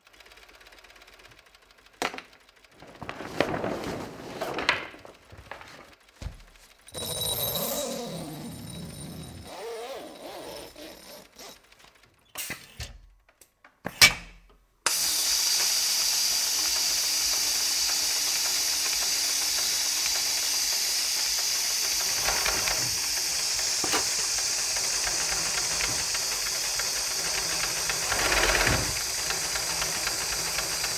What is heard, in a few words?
sail sewing zipper ambience hiss mechanic hydraulic dynamic; voss lennart